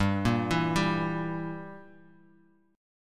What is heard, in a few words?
E Diminished G